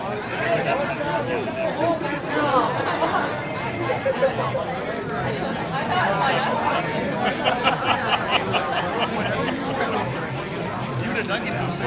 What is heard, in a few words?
crowd outside, ambience sounds